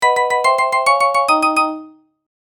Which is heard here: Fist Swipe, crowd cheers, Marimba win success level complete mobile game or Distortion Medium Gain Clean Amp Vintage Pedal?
Marimba win success level complete mobile game